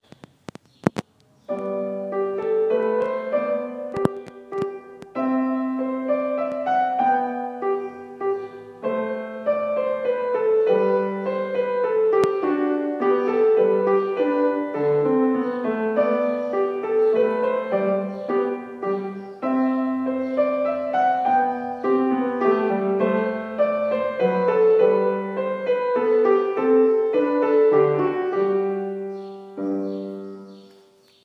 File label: minuet bach c